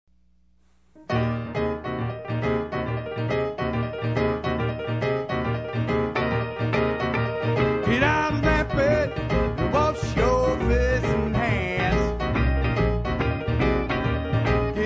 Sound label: solo piano and voice